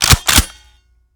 reload empty end chamber; gmod

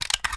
fast reload exmag magout; gmod

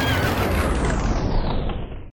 shutdown, vehicles

